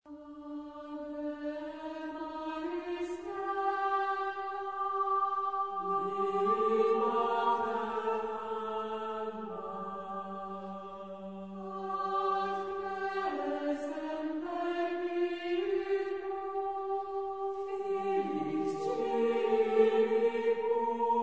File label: Ave maris stella (female), chorus